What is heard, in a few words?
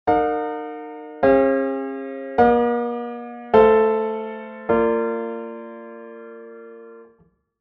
17 prog piano c, 17-ET